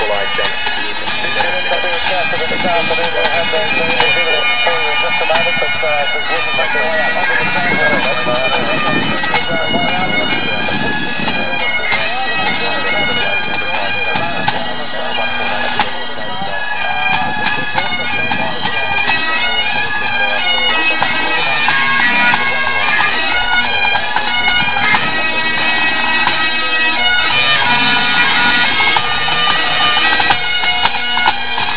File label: Pipers; Adelaide Cup